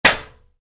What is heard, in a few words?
Wood hit aluminium, Wood, Light Impact: Aluminum; Materials; Stuart Duffield